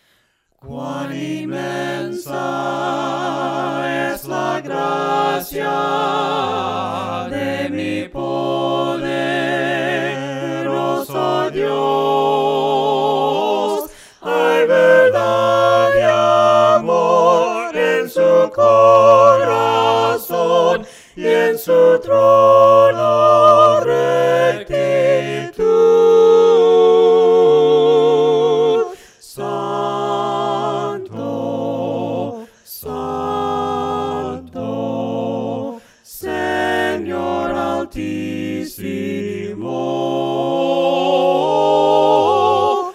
VOCES, himnos a capella; INMENSA GRACIA; cantos del camino

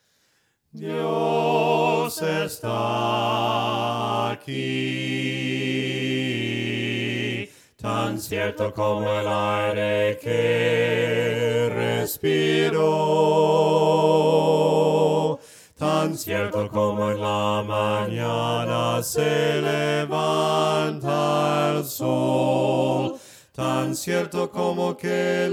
DIOS ESTA AQUI VOCES, himnos a capella; cantos del camino

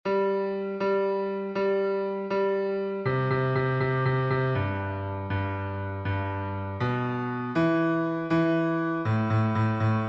bajo, himnos en piano; 91 GLORIA GLORIA; cantos del camino

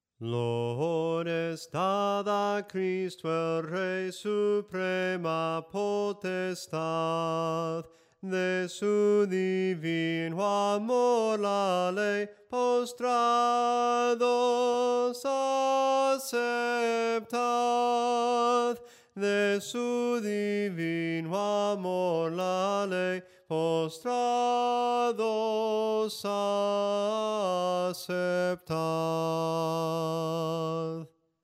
SOPRANO CANTADO, himnos en melodia soprano

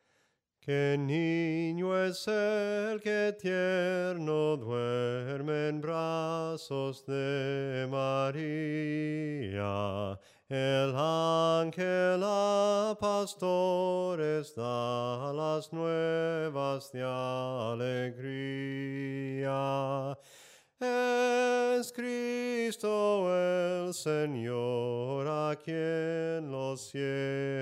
SOPRANO CANTADO; JESUS ES EL MESIAS

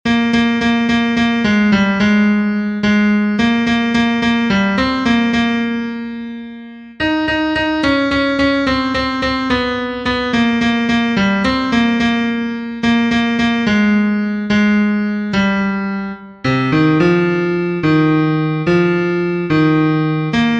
TENOR, himnos en piano; cantos del camino

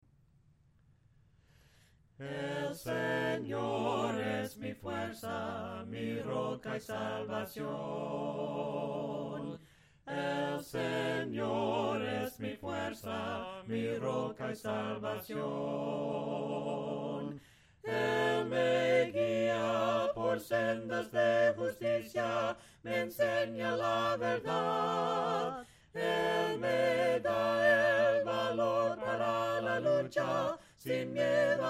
EL SENOR ES MI FUERZA, himnos a capella; cantos del camino

